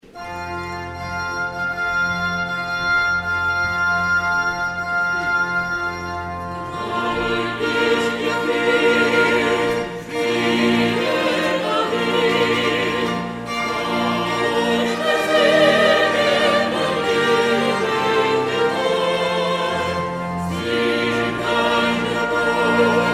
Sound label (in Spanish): marcha nupcial wagner